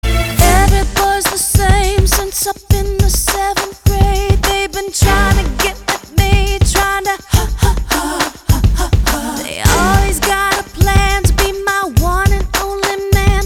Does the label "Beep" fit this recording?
Yes